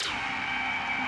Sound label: Space Ship Engine